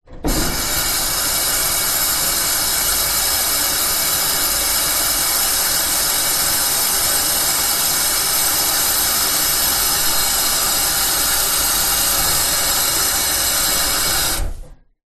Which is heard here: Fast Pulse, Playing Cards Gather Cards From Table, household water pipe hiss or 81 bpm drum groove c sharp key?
household water pipe hiss